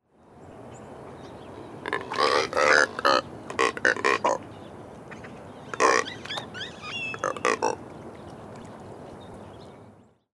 Neotropic Cormorant Phalacrocorax brasilianus; waterfowl

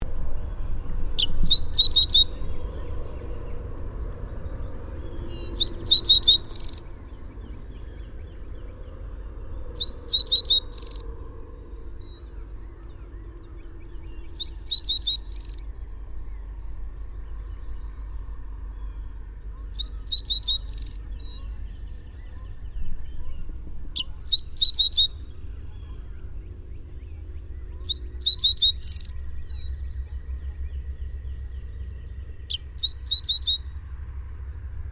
Dickcissel, Spiza americana; cardinals tanagers grosbeaks

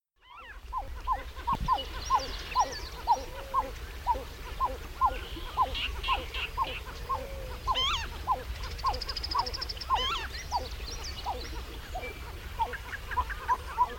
Pied-billed Grebe Podilymbus podiceps; waterfowl

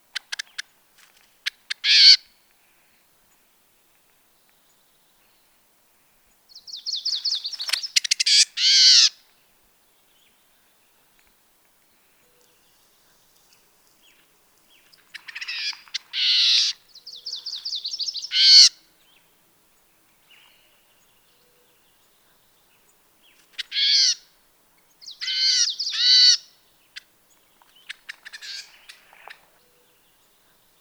Warbling Vireo, Vireo gilvus; vireos

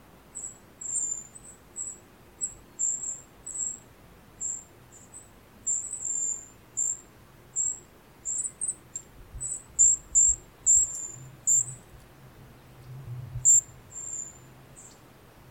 Golden-crowned Kinglet, Regulus satrapa

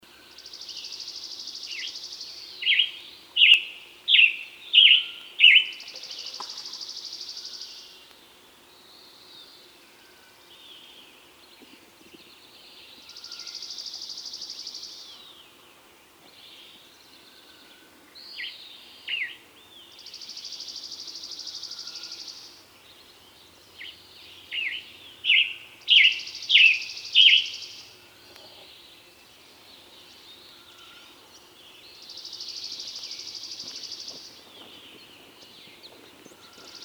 Western Tanager, Piranga ludoviciana; cardinals tanagers grosbeaks

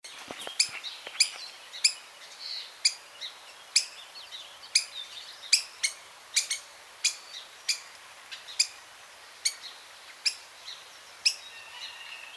Black-headed Grosbeak, Pheucticus melanocephalus; cardinals tanagers grosbeaks

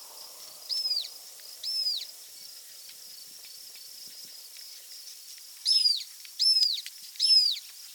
Northern Harrier, Circus hudsonius; raptors

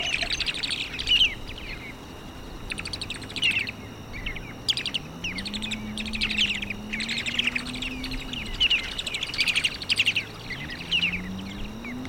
Short-billed Dowitcher, Limnodromus griseus; shorebirds rails

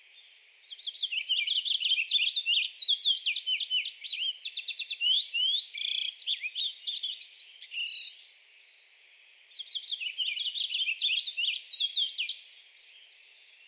Purple Finch, Haemorhous purpureus; finches

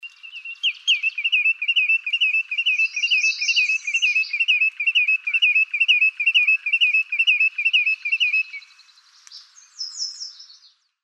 Lesser Yellowlegs, Tringa flavipes; shorebirds rails

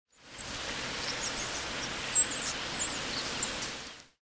Nelson's Sparrow Ammodramus nelsoni; sparrows

